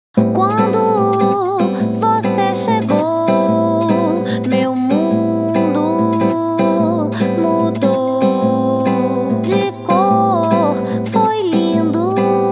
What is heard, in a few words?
accompaniment & vocals